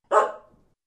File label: Single Dog Bark